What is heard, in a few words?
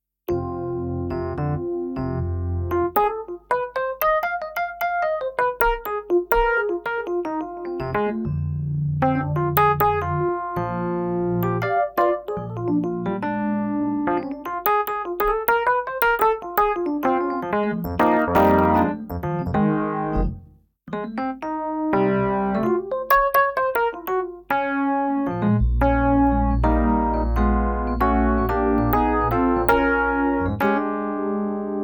Kurzweil EP; pc3